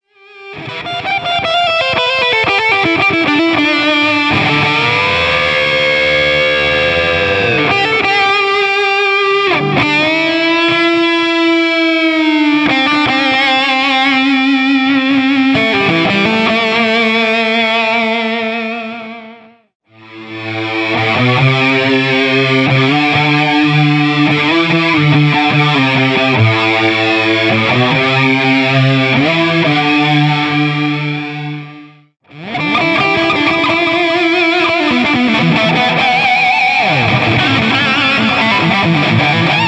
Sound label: Tube FX Loop